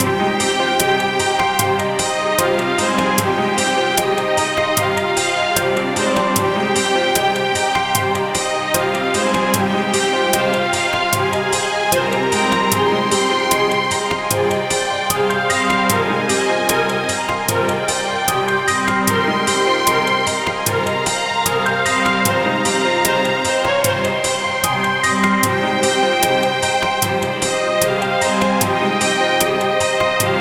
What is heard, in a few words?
Loop; 音楽素材